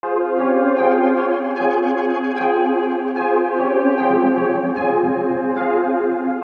Melodic Loops

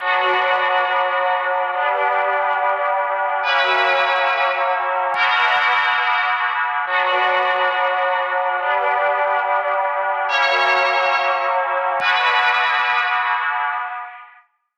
Drill

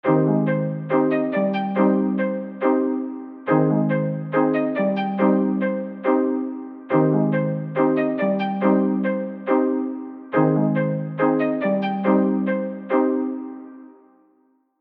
Luxury Sample

